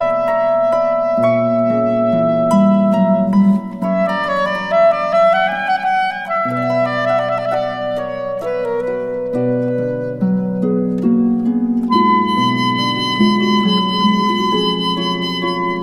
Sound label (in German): adagio und andante sopransaxophon